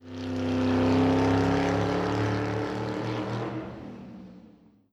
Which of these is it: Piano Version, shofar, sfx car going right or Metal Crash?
sfx car going right